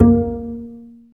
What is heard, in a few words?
STR CB JZ, Cb JazzPizz